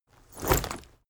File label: leather armor movement